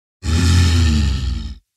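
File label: orc agrees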